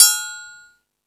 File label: Percussion, Drum Machines